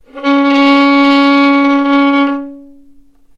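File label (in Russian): скрипка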